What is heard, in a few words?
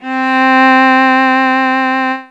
cello